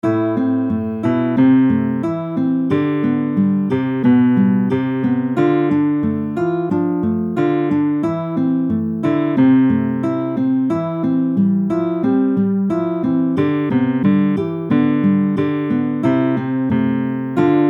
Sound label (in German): Gitarre und Klavier; pfadfinden